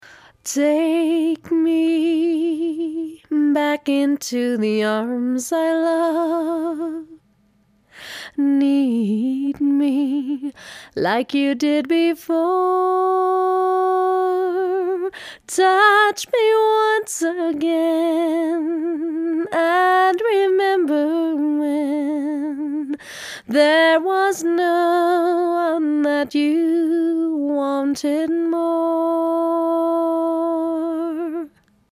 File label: compressed vocal